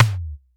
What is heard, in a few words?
noise tom, Drum Machines, Clavia Nord-Drum; 300 Drum Machines